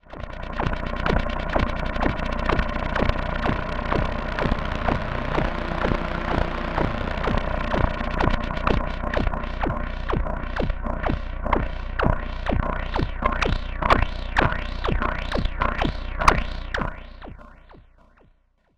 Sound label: Loops And Drones